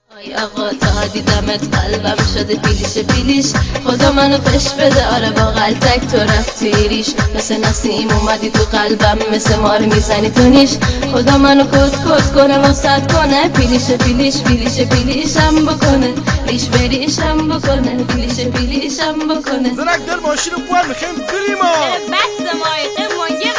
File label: Music Bandari & Jonobi Shad